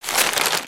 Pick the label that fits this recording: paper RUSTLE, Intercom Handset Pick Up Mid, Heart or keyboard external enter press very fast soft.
paper RUSTLE